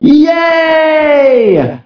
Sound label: yeeeh